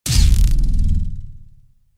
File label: woosh